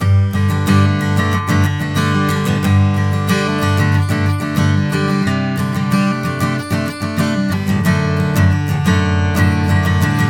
Just Strumming

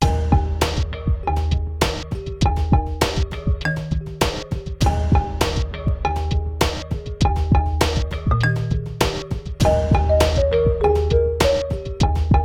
Mallet Groove

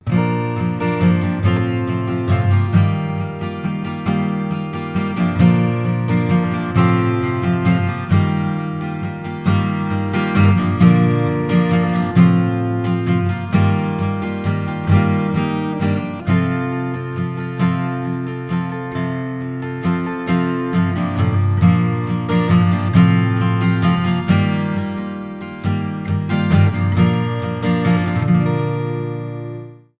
Gentle Touch, simply guitar

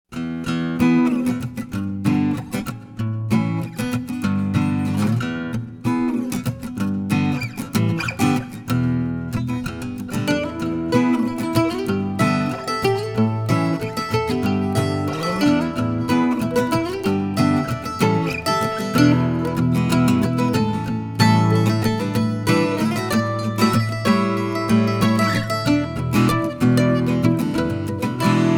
acoustic studio

